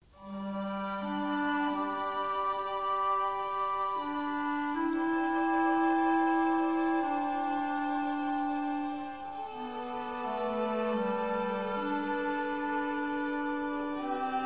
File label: Orchestra and Choir